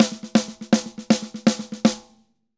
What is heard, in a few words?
Drums Poly 120, Drums & Percussion; Best Service; Festa Latina VOL-1